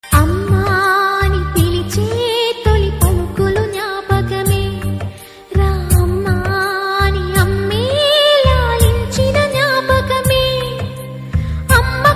Ringtone

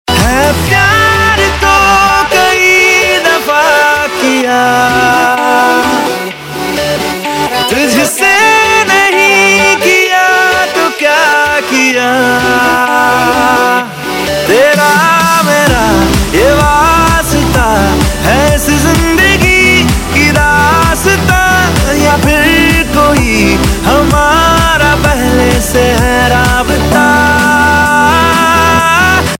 Ringtone Male

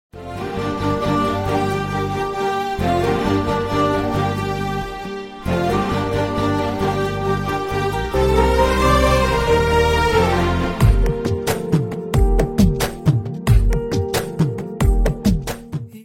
Instrumental Ringtone

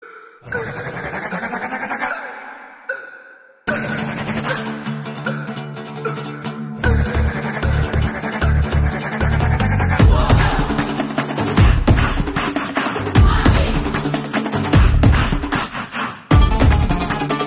BGM Drum Beats Ringtone; Pushpa 2

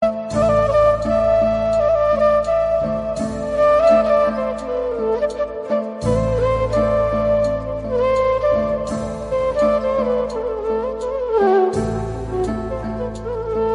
Flute BGM Ringtone; Holiday

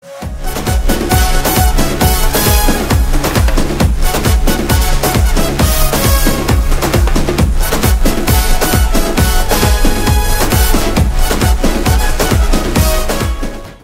Instrumental Ringtone

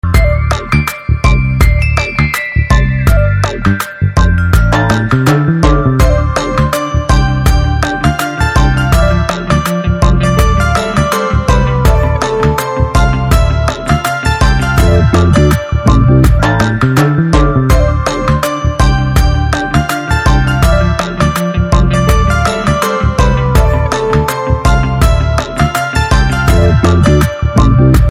Instrumental Ringtone

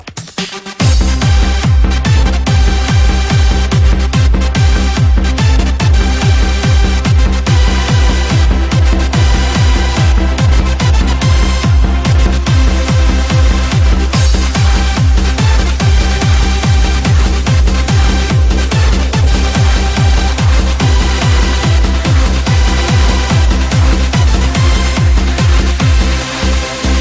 Band Theme Ringtone

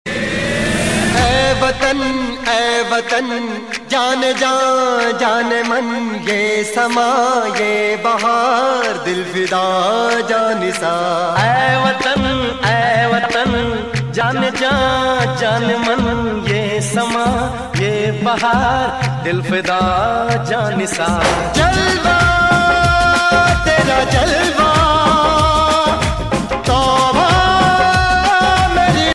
Ringtone

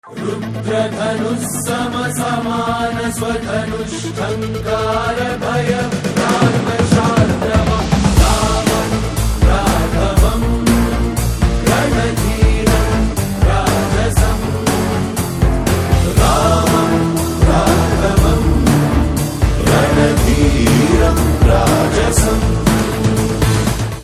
BGM Theme Ringtone

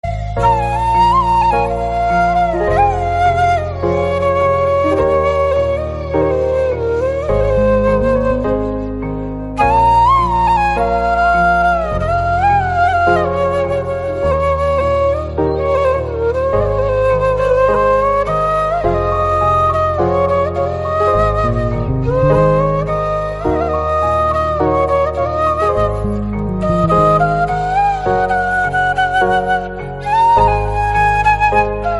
Instrumental Flute BGM Ringtone; Article 15

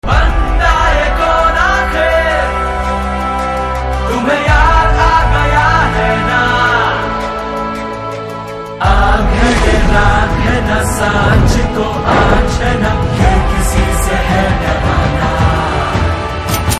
Hindi Trailer BG Tone; Vishwaroopam 2

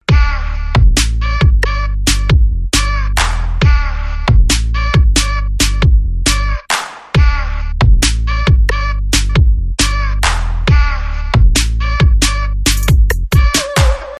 BGM Ringtone

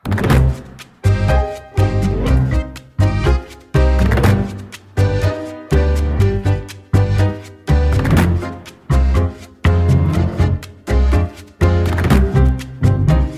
Instrumental Tone

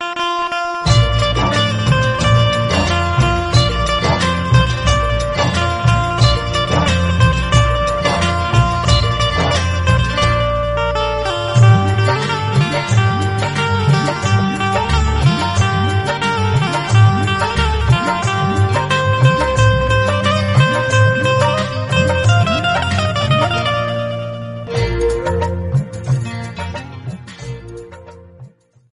Instrumental Ringtone

